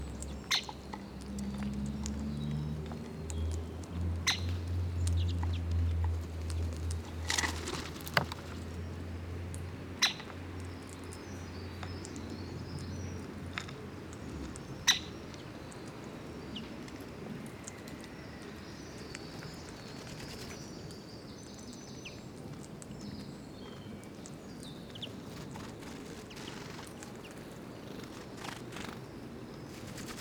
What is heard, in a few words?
Great Spotted Woodpecker Dendrocopos major